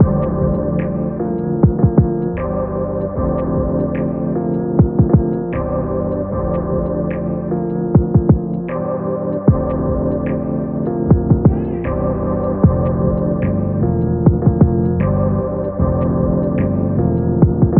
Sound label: lofi